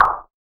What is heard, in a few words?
snarelike; Sonic Foundry (Sony Creative Software); Ugly Remnants VOL-2; Beatstuff